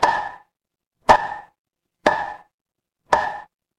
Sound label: instrument wood block